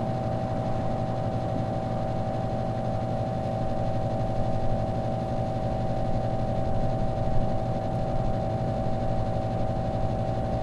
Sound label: generator hum; boneyard; Titanfall 2